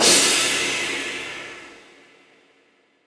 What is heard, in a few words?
Crash, impact samples